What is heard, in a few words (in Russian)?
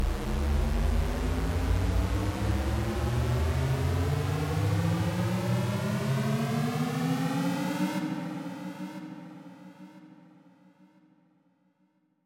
zabavnij zvuk pri zaryadke v igre